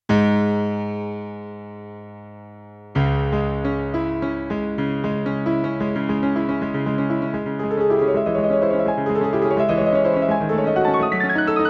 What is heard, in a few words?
SK-88Pro, piano